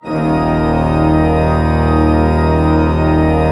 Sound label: D1, Cathedral Organ; ILIO; Propeller Islands Cathedral Organ